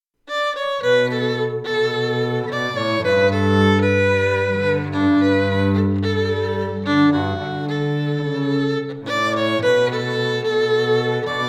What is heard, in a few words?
DUO, strings